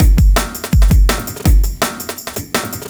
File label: Drum Loops, 165; BottleGenie; M8; Electronisounds